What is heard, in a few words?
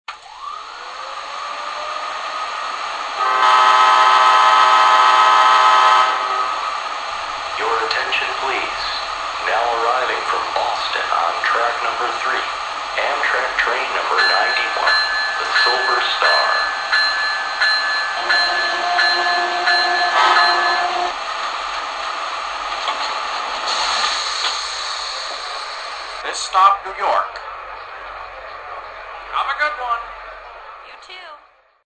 AEM7, electric trains